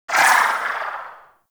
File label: Sapient Pistol Tail; Warframe